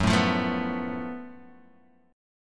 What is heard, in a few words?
F sharp Minor Major 7th Double Flat 5th, Guitar, Half Step Down